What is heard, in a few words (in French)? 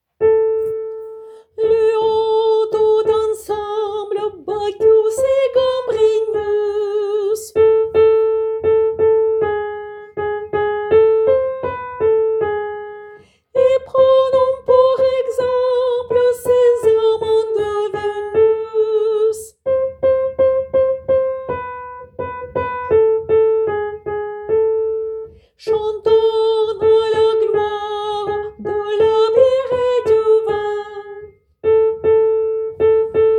Bacchus voix soprani